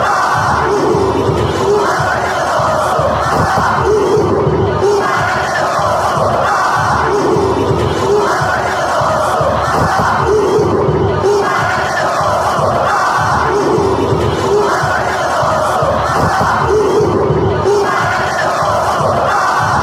maraca is ours, fanchants

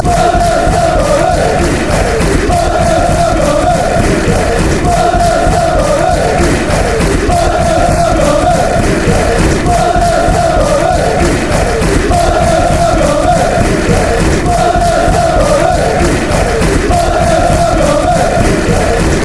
claret and blue army, fanchants; martin oneillss

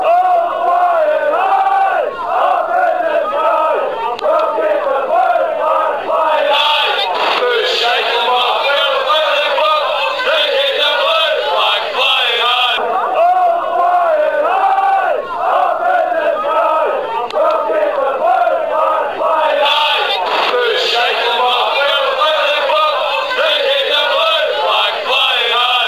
blue flag, fanchants

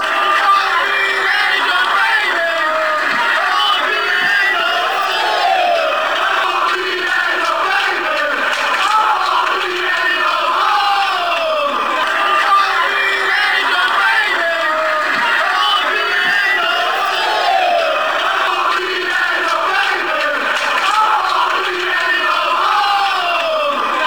oviedo baby oviedo woahh, fanchants

